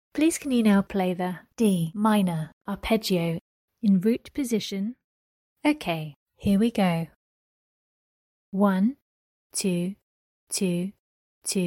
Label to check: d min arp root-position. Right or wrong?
Right